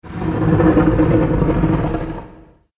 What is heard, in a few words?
Secret Bookcase Door; Miscellaneous Door Sound Effects